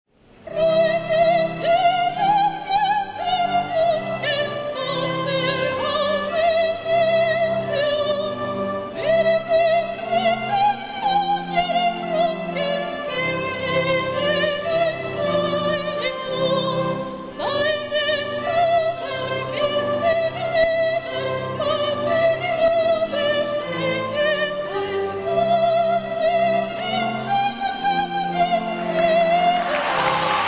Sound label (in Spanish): Himno a la alegria Violin Voz; bodas